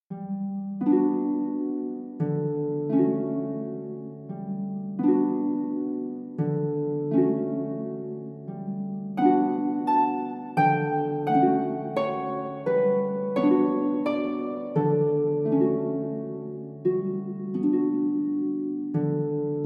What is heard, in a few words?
Harp